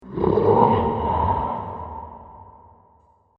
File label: Cavern Creature, monster; horror; fantasy sound effect; Andrew Fleming